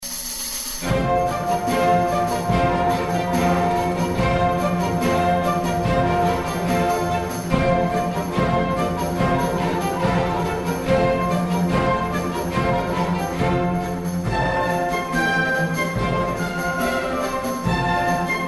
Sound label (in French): Tambourin; DAPHNIS ET ALCIMADURE